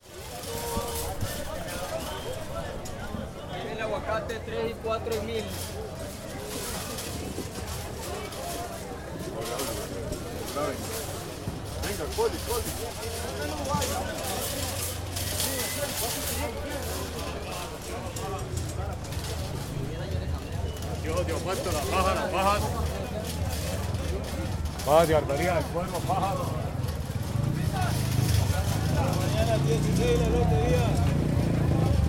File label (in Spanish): Guitarra en la feria de Plaza Viquez